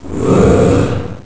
Bound Chest Spirits Roar

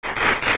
Appear; Stalchild; zelda; OOT

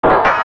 Iron Knuckle Crumble

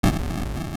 Candle; Use the Candle

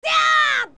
Young Strong Attack; Link's strong attack shouts (spin attack or jump-slash)

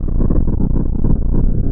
Quake Double; LTTP

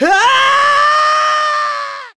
KO Far; Link knocked far off the stage

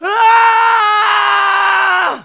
Big Fall; TP; Link

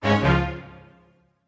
Battle Strike

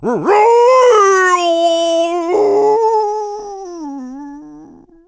Thrown; A rolling Goron being thrown by Link

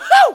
Unmerge; Yuga un-merging from walls; Zelda

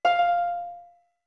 Notes Harp F short; OOT

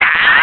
Spin Attack; Link's spin-attack shouts

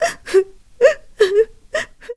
Cry; Sue-Belle; zelda sounds

